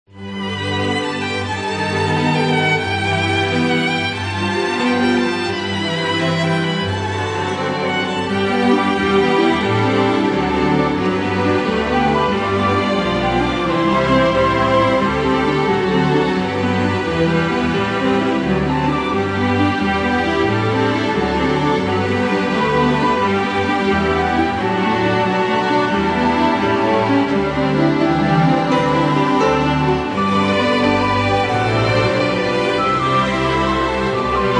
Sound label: Orchestra, Strings